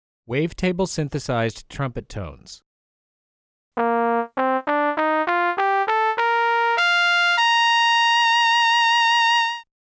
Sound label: Wavetable Synthesized Trumpet